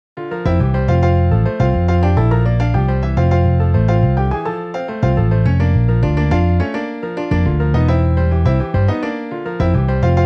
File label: Piano Bass MIDI